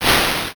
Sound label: swoosh